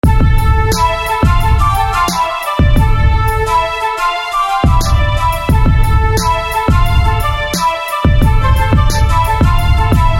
Wind; 5th Wind; SFB FX

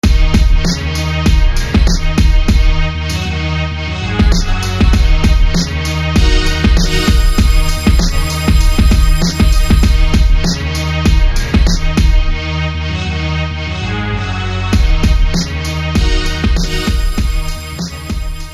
Stringzz; SFB FX

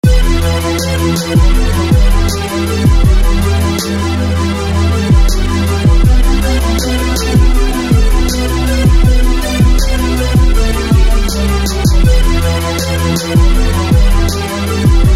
Flange Synth

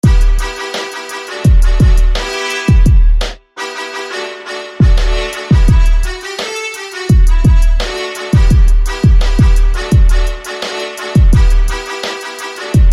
Trumpet; Magnum; SFB FX

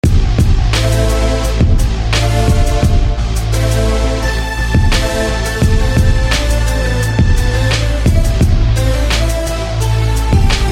Burning Pipesaw

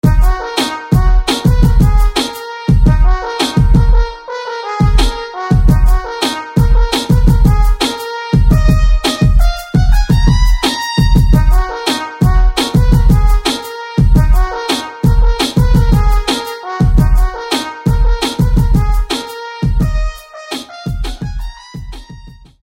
Trumpet; Sharpy's; SFB FX

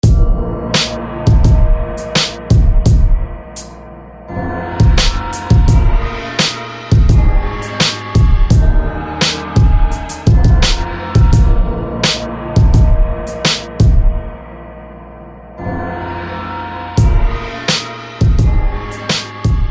Gong; Gladiator; SFB FX

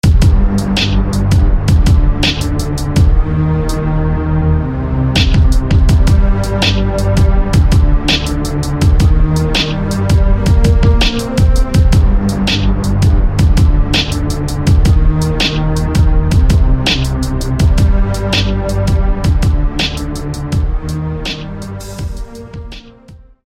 Haunting Bow; SFB FX

